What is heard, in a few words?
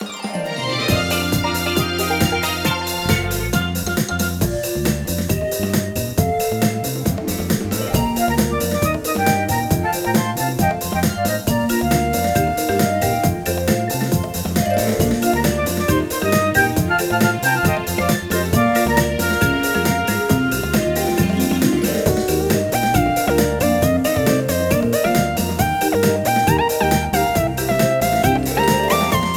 Water Park Underwater Frontrunning